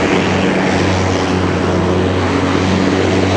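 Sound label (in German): Propeller Flugzeug; Breakneck; Austral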